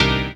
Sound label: CHORDS; EastWest; Dance Mega Hip Hop Electro